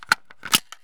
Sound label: saiga stock open; Escape from Tarkov